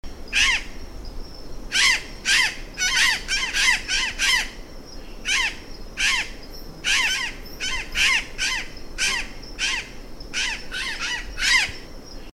Cyanocorax caerulescens; EE Juréia; SP

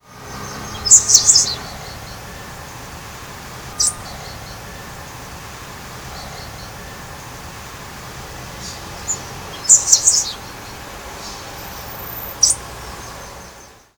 Tiaris bicolor, Aruba; Bubali; Silveira

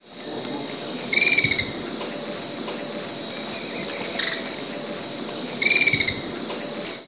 Hemitriccus orbitatus; PE Cantareira SP

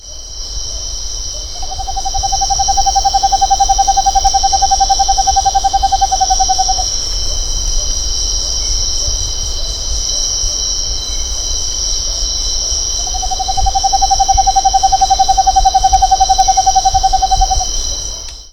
Megascops atricapilla; F Ang Ubatuba SP; Brazil

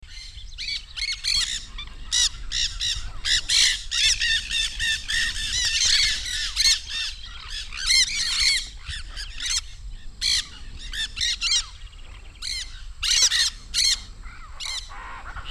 Aratinga nenday; RE Caiman; A Silveira

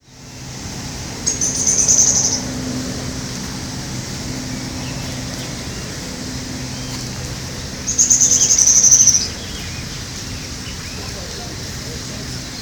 Cranioleuca pallida; Ibirapuera Park; SP; Brazil

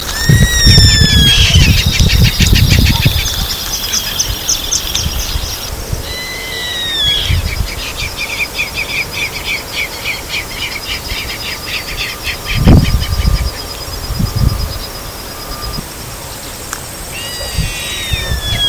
Milvago chimango 3ind; Silveira

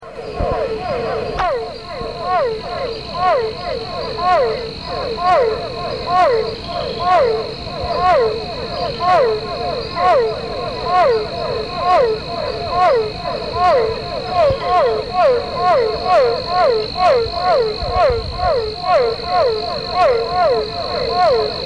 Physalaemus cuvieri; Itapecerica da Serra SP; Brasil; A Silveira

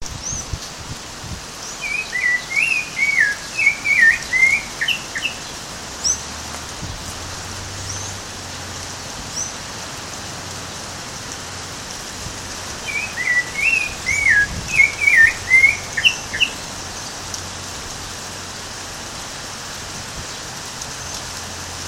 Turdus rufiventris; I Comandatuba BA; Silveira

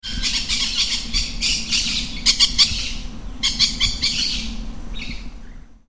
Pyrrhura frontalis, Intervales Park, Brazil; Silveira

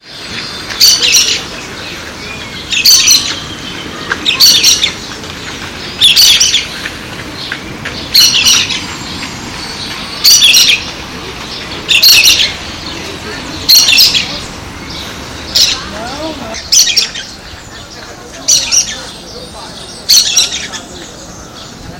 Brotogeris chiriri; Pq Moinhos de Vento

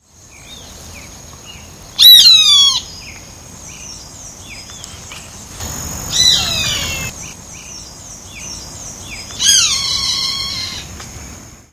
Pardirallus nigricans callnote; Jd Botanico POA RS

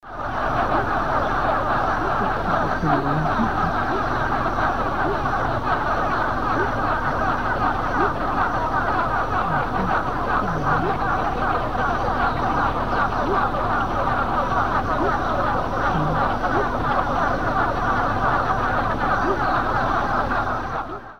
Tachycephalus mesophaea breeding; EE Jureia; SP; A Silveira

